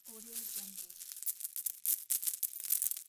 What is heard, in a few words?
coin pile shuffle